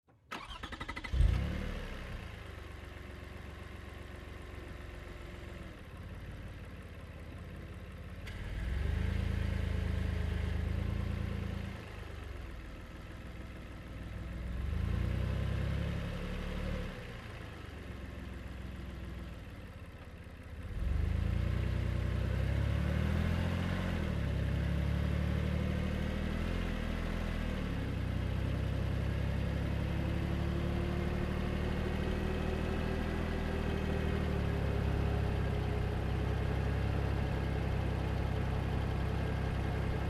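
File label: Car Highlander Onboard Drive Crawl; 2005 Toyota Highlander V6; Car Highlander Ob Drive Crawl; Cars; Vehicles; Big Room Sound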